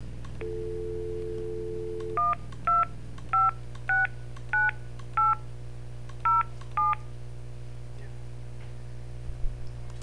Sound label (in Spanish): marcacion